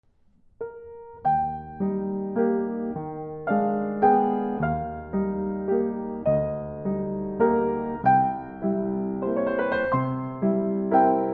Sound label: Nocturne in E Flat, Piano; Chopin